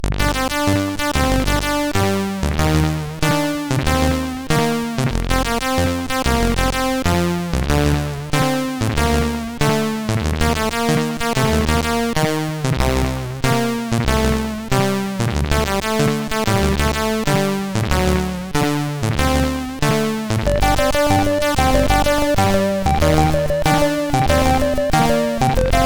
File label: sid 8580; Cheared Tears